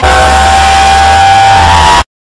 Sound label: splaat scream; voicemod